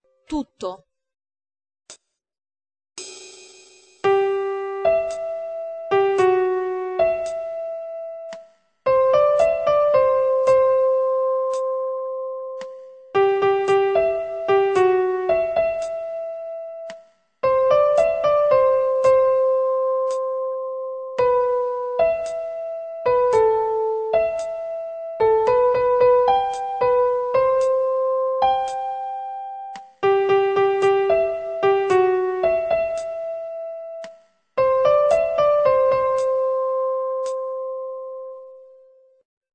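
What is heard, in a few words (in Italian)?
sincope 4/4 C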